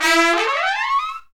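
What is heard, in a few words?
brass trumpet; Roland L-CDX-03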